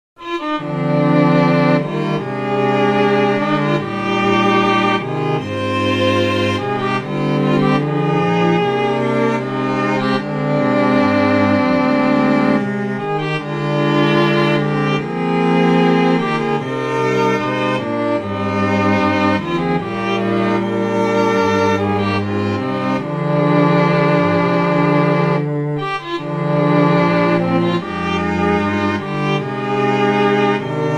Anthem; Skellan

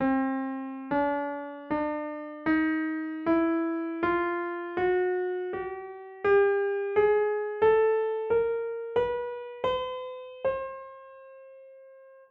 29edo; Nautilus14

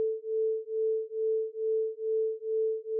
Autogenerated sine 120 cents

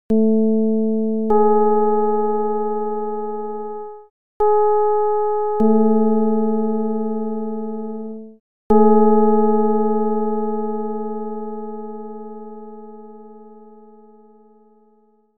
csound foscil 220hz; Ji 44 23

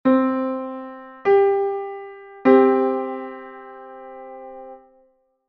0-720 fifth (5-EDO)

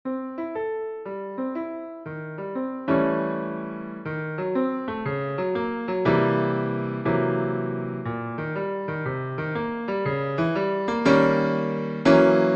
Octatonic Groove 12 edo

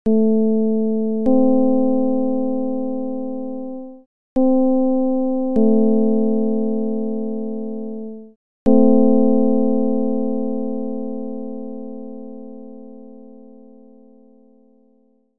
csound foscil 220hz

